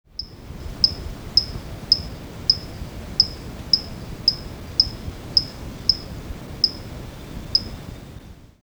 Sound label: Mantella haraldmeieri, amphibia